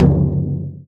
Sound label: BASS, INDIAN PERC